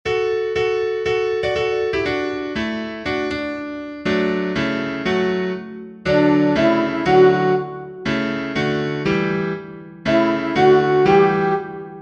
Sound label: Backing Track Noisy Animals Song